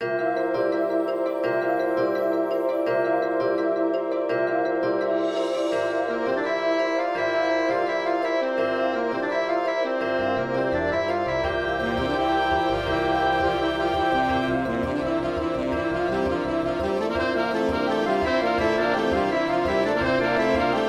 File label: easy perc synth